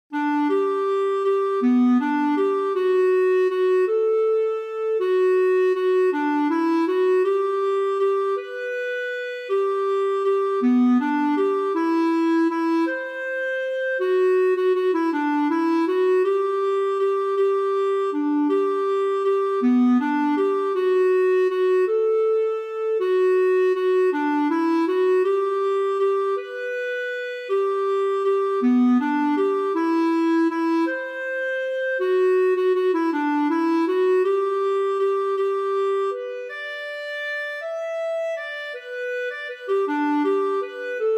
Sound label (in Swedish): valsen G; Kvasarvalsen